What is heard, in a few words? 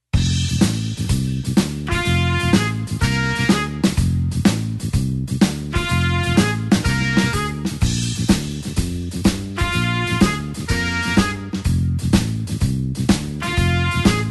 blues horns shuffle F